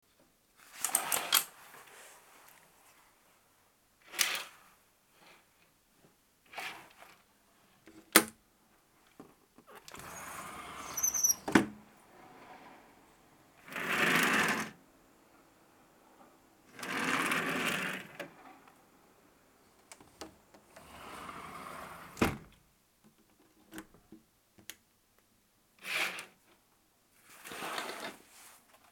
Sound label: window; カーテン・レース・カギ・窓・網戸を開けて閉める